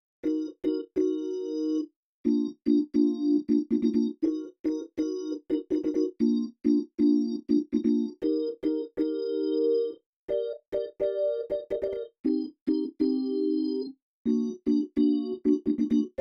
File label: ORGAN